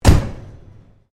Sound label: Door Pivot Industrial Freezer Slam Stereo, Industrial fridge door